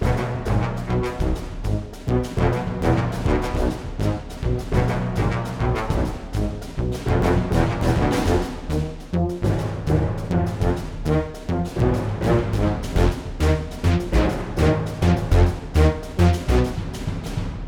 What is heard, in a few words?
Low Loop; Plants vs. Zombies Battle for Neighborville; PickleBoss